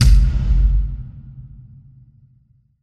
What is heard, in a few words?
boom out